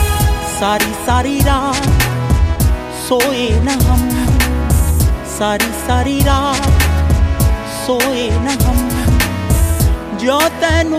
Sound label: Violin